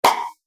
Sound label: Single Dramatic Hit, impact; Explosion